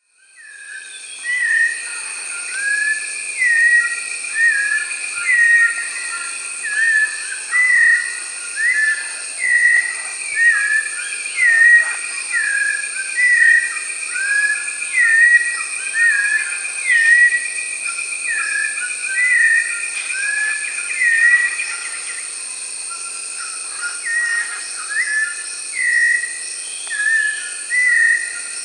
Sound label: White-necked Thrush (Turdus albicollis), Peru; S. America